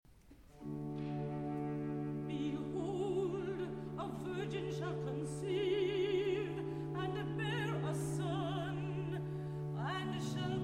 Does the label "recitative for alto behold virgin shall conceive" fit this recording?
Yes